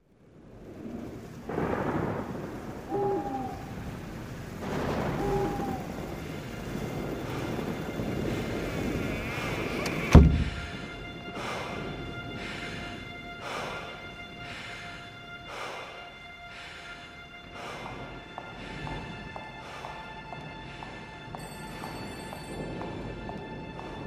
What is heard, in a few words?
rock